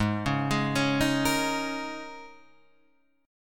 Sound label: G sharp Suspended 2nd Suspended 4th G sharp Guitar Standard; arpeggiated; 4,4,6,6,4,6